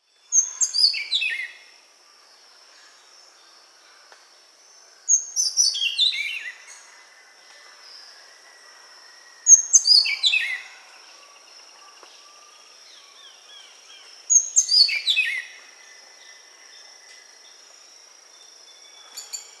Buff-throated Saltator (Saltator maximus), Peru, Birds of the Amazon; S. America